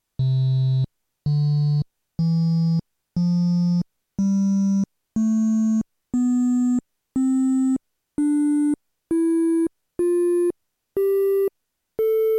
ym2612 tri

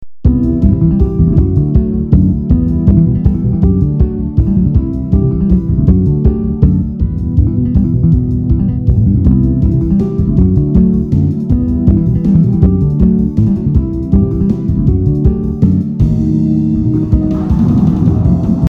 bassline

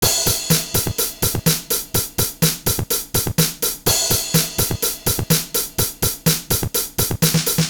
drum loop

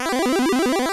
arp

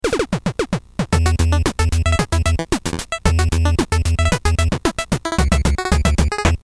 gyromite nes

